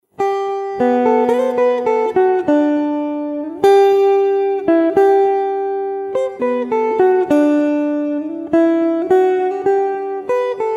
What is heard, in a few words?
Sample Electro version